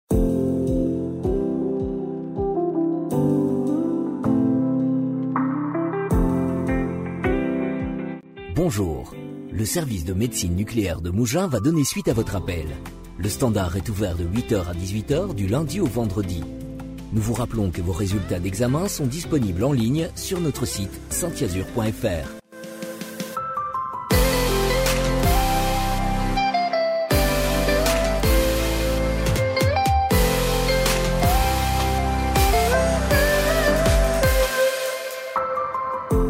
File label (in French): Attente musicale; exemples de messages vocaux